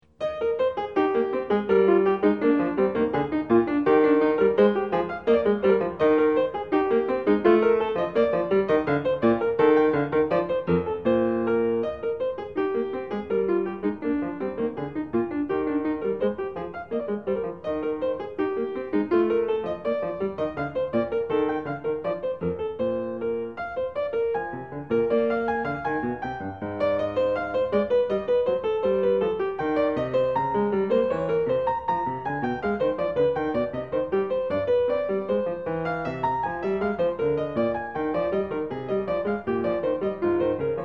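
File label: Bach French Suite 4 gavotte, piano; bwv 815; FRENCH SUITES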